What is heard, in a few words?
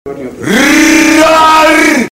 raar